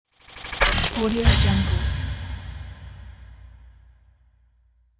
digital glitch intro logo

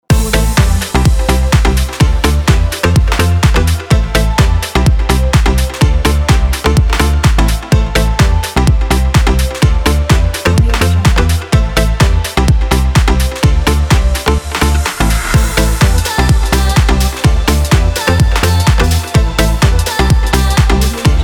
house

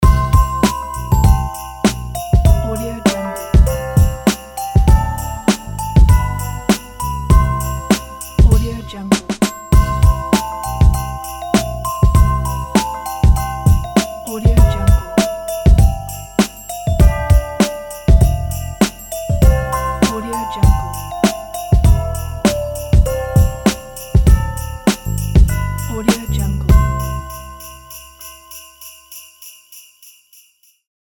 christmas holiday hip hop beat

